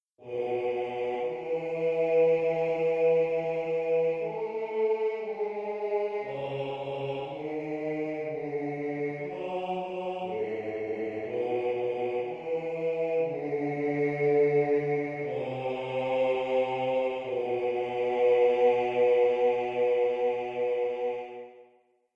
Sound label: Swing Low Bari, barbershop tags